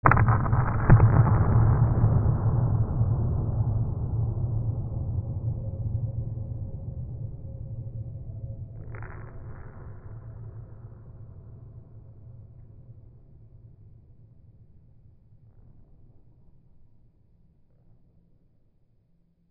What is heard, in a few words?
WEAPONS